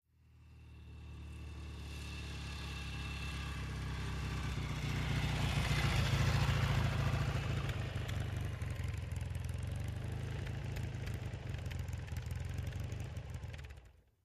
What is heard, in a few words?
Mcycle Norton Slow By